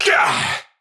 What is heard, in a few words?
Attack Effort, Battle; Warframe; Tau; Dax; Knight